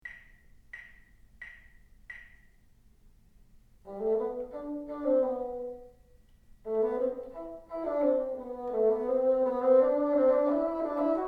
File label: teacher, bassoon